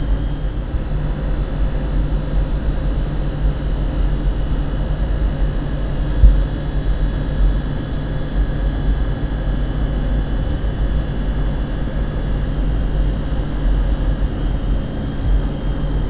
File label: refridge